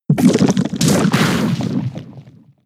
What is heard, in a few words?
Mud Bomb; Pokemon; gen 6; XY; ORAS